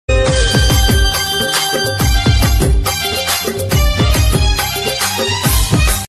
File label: Bhajan Ringtone Bhakti Ringtone; Maa Durga; Navaratri Ringtones; Durga Devi Ringtones